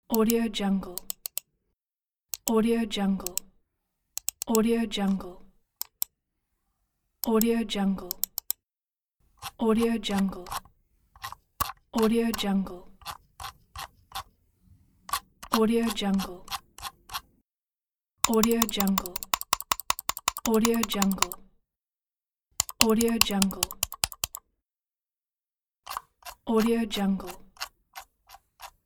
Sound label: pc mouse